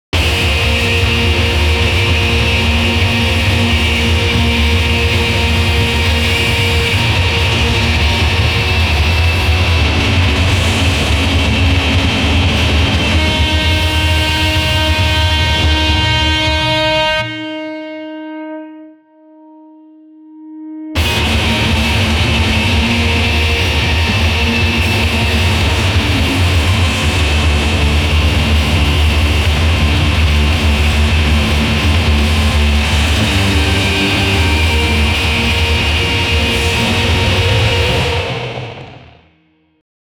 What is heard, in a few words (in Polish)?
gitarowe rify; film